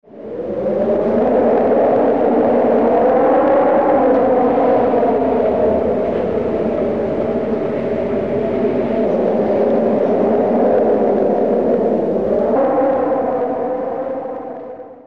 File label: gale